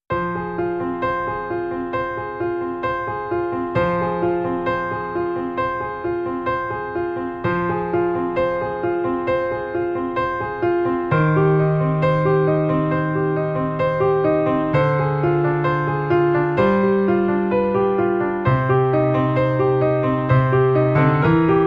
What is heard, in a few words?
Gentle Flow Piano Intimate